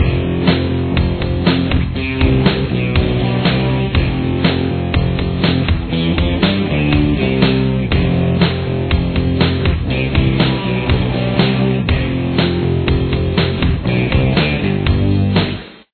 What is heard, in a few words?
chorus, guitar